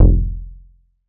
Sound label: bass tweak rub F; Polyend; ARC Noise